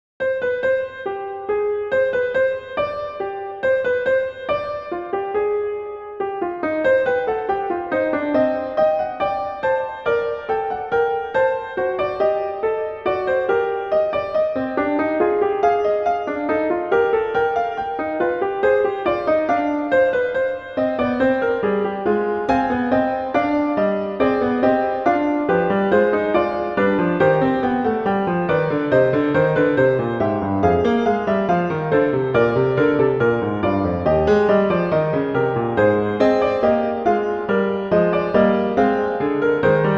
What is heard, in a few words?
Fugue; Bach; Prelude Fugue II